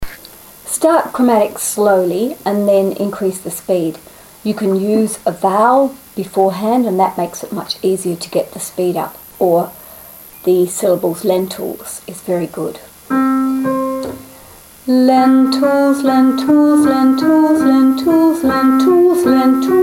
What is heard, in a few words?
Chromatic scale on 'Lentils', Singing Exercises